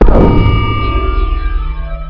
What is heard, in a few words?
anti matter bomb explosion